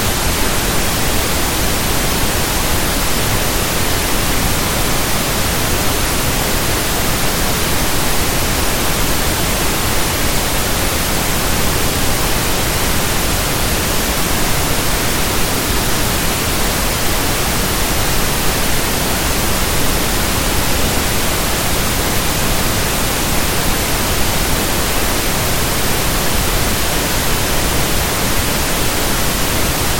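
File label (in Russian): розовый шум 48 kHz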